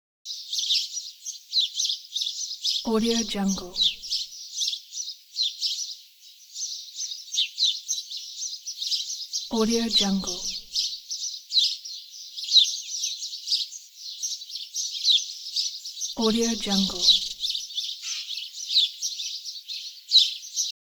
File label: birds